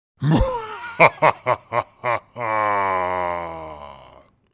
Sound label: Deep Ha Ha Ha; Soundzabound Volume 3 Disc 3